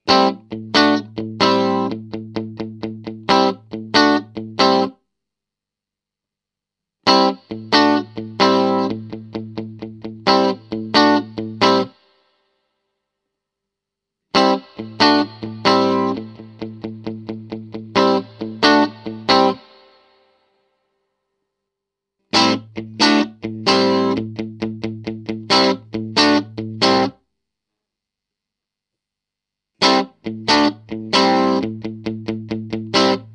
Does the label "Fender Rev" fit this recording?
Yes